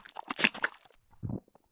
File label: slurp; mahlendur